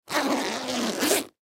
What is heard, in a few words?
Zipper Leather Bag Zip; Unzip; Zippers; Foley; Objects; Blastwave